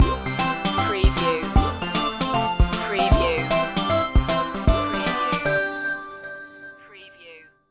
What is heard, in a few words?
retro video game pipe world stinger